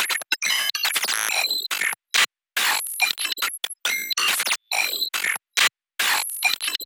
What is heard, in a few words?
Glitch Loop